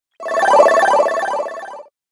Retro Videogame Fx Passing Fx

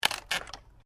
WOOD PLANK TWIST

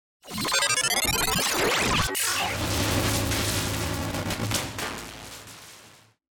Digital Distortion Left To Right; Digital Glitch Distortion Right To Left Crossing

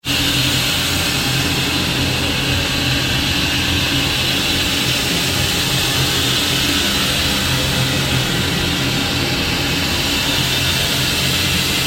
Ambient Drone Slow Sweep; Sound effects created by manipulating other sounds; Sound Design; SoundEffects-Foundation1

